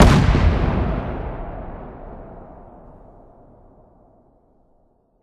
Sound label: Explosion Gasbag; War Ambience; Prydwen